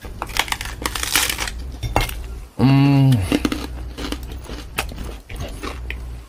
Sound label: asmr